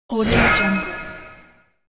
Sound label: shield magic protection